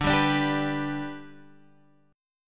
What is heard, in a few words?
G Major D, chord